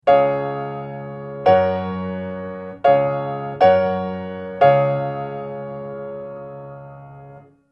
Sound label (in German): akkordfolgen